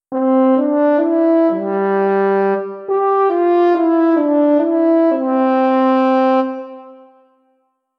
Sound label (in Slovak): lesný roh